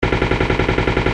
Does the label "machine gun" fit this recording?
Yes